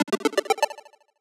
Melodic Retro 8-Bit Chiptune B6

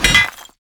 Hammer Wall Bricks Break

